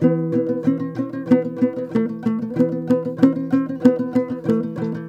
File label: TOPSHUR; Soundscan vol.60; Asian Traditions; AKAI